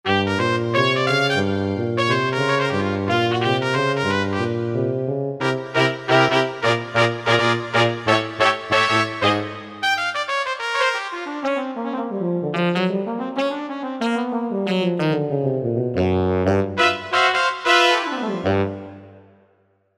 Brass Sax, Korg MSC-1S; Korg MSC-1S Variety

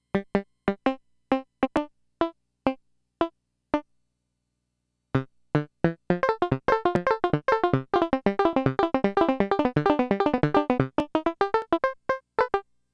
Banjo

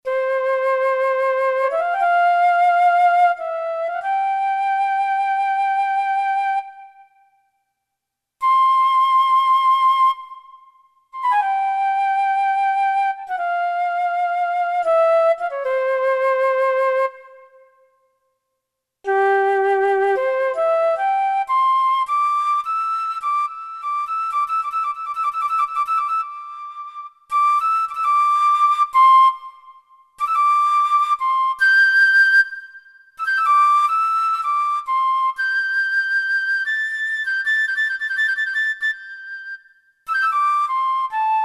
Flute

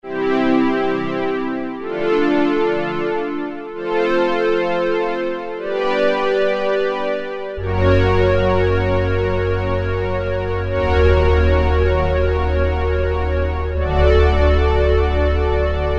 Brass & Strings, Roland Juno-106; Factory Preset

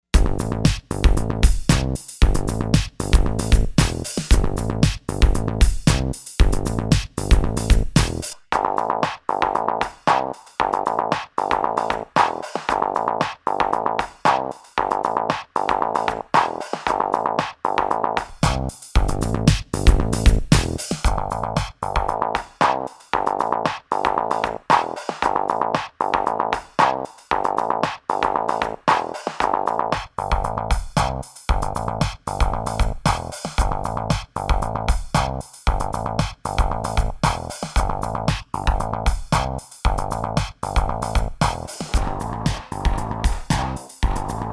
Cheap Radio, Roland SRV-3030; Preset

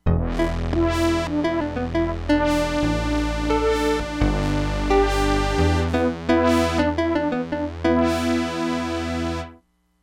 PIANO SYNTH BRASS

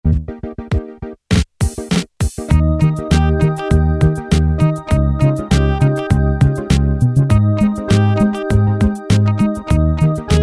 disco II, Automatic Rhythms, Technics SX-K450

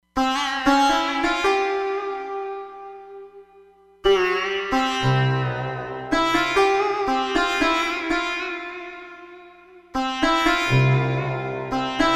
Neo Sitar

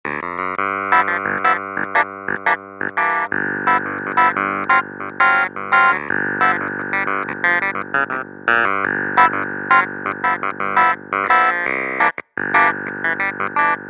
Clav

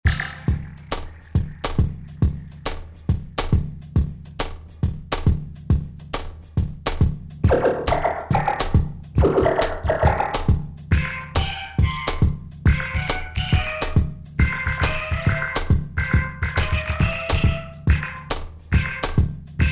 138, Hip Hop; Roland SR-JV80-12 Hip Hop Collection

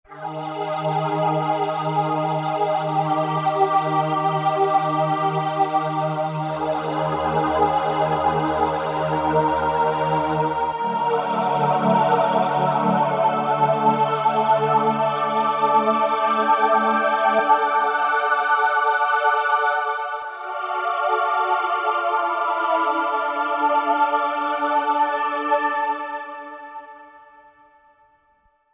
Digi Aaaah, Roland V-Synth; Factory Patches

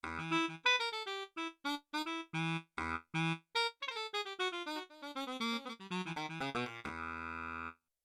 Stereo Filter, Four Saxes; Yamaha TX16W

